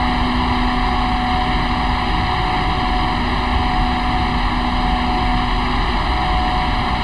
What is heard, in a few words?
Run, Train; OpenBVE